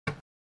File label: Buttons release; coolmathgames; truck loader 4